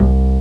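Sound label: Acoustic Bass (C1); X-Wing; X-Wing Patches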